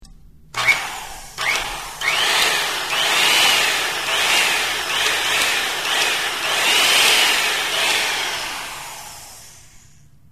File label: saw burst